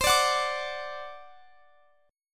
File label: C Suspended 2nd Flat 5th C down Guitar Standard; Csus2b5 strummed